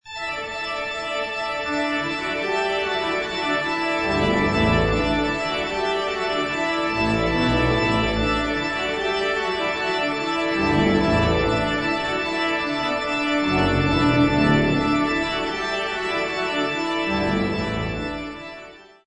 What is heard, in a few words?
Carillon Toccata